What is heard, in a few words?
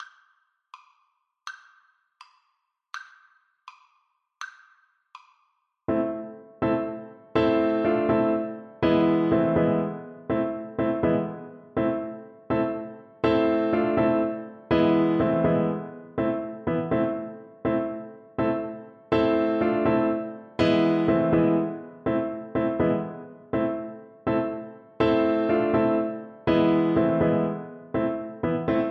horn, French Horn